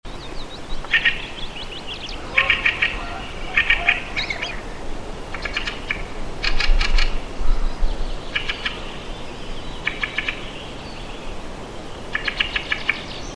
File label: Turdus pilaris